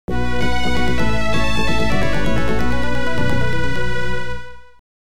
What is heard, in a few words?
OPL2; End Of Level; Tyrian